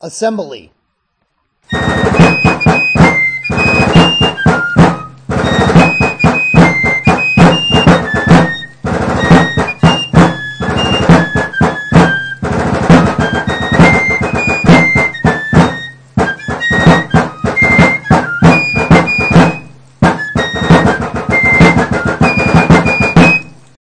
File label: Assembly or Troop